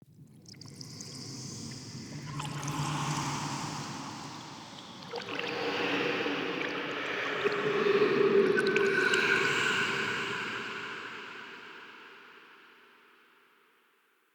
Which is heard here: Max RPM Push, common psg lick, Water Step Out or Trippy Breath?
Trippy Breath